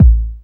round kick drum single shot a key, kick drum one shot tuned to the A note